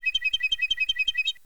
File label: Waving Straw FX; Ed Edd n Eddy; Adromulus Pack; EEnE